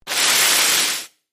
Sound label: connect; monstergo; pokebot